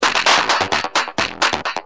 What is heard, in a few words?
Dist, Industrial, Drums Perc